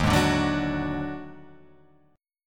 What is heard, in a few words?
Eb Minor Major 7th Double Flat 5th; JGuitar